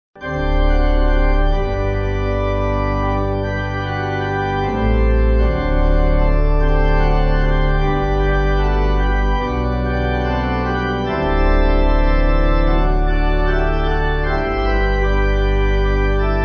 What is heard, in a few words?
Pipe